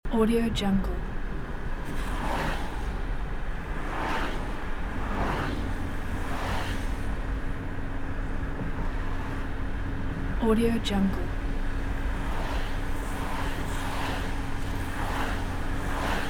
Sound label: driving on rainy motorway with opened window loop; urban sounds